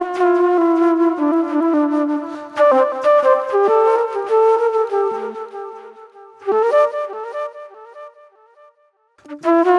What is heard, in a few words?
Acid Trip Flute; Sonic Foundry (Sony Creative Software); Manhattan Flutes; Smooth Groove